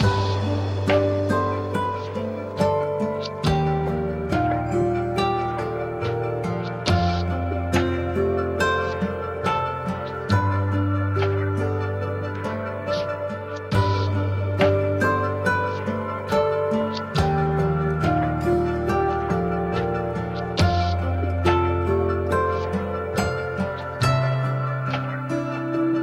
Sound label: avg warm loop